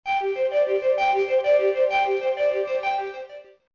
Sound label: News Intro Music